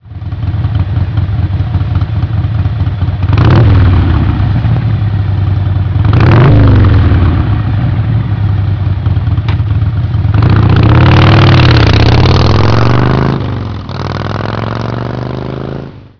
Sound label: engine sound, harley